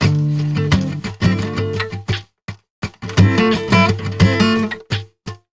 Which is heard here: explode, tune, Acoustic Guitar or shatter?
Acoustic Guitar